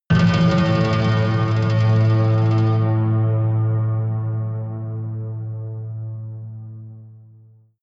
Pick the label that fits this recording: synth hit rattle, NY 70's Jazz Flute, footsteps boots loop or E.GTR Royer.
synth hit rattle